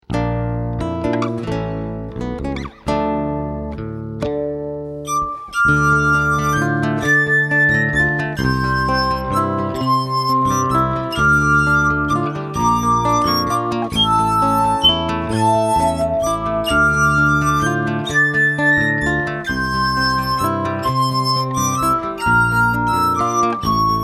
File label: glass harp